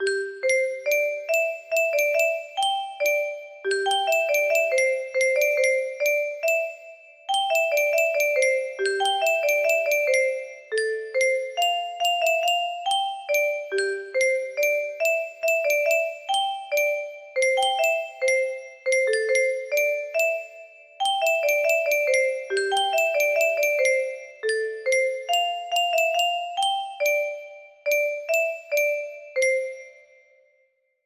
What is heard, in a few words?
random music box